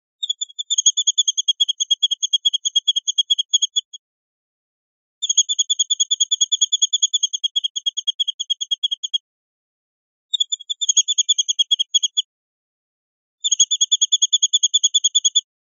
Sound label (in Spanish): tiriteos, pardillo